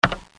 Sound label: Button; KARUTA